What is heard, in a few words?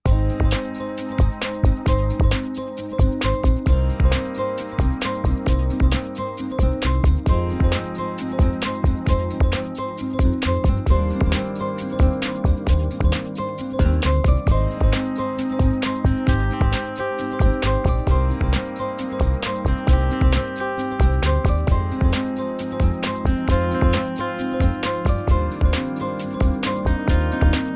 no ac gtr